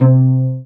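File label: cello pizzicato G; AKAI S-Series CD-ROM Sound Library VOL-2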